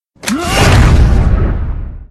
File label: snaps fingers; thanos; torchlight